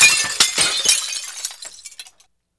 rupture glass window debris shards medium; soundmorph

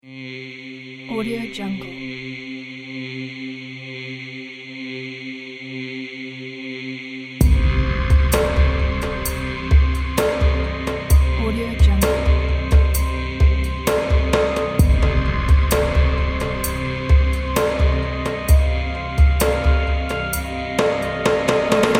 african voodoo

